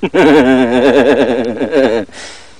laugh

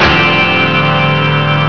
CHORD

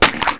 CRUSH

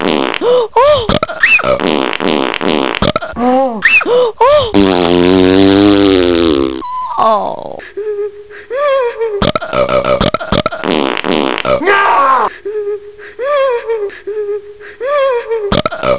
POOT; SONRIGOLOS

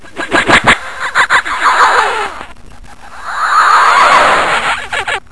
LAZEEEEEEEER

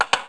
SWITCH

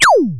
PHASER, WEAPONS

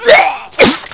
PUKE

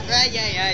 ayayae

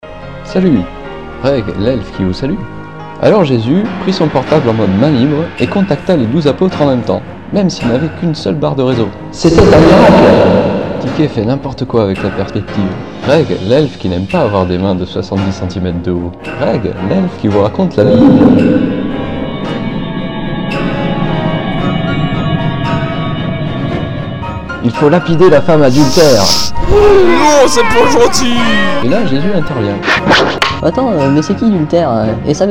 YEEEEEEEEEEEEEE

